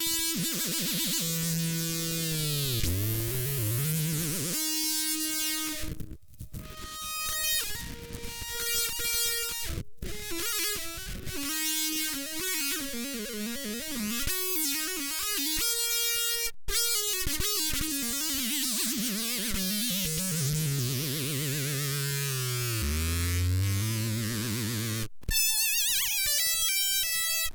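Tone Change Moog, fuzz pedal, played on a MiniMoog; ARIA/DIAMOND FUZZ(IBANEZ STANDARD FUZZ)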